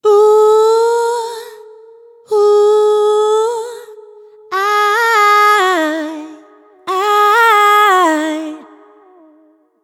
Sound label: Adlib "Oeh" Wet, F-, 105